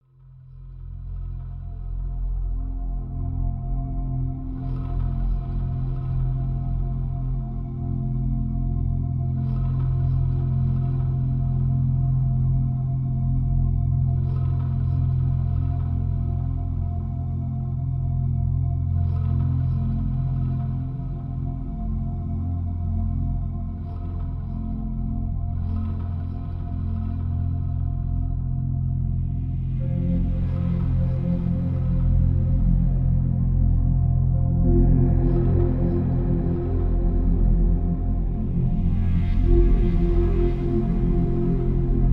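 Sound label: Drone Emotion Sickness (F); Brand X Music; Brand X Music Catalogue - Editor's Essentials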